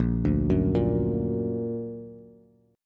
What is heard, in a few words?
Bb Augmented Bb, Bass; arpeggiated; JGuitar